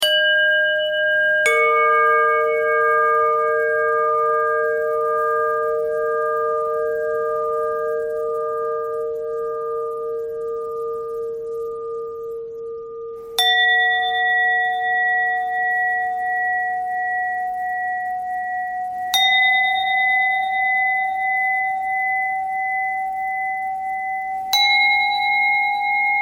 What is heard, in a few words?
bell threads